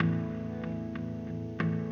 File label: ELEC GUIT ETHNO 125 A, Guitar; eJay